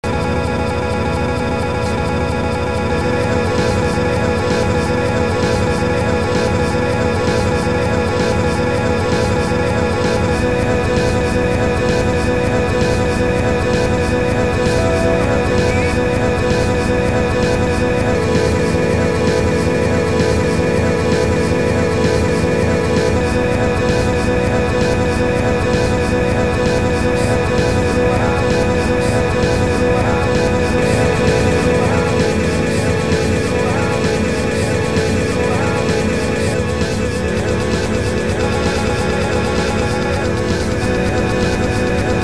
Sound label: looper example